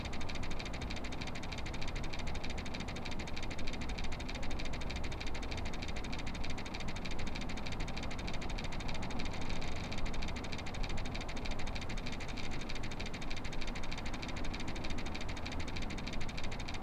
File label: indoor classroom projector spin; LOOP; anemoi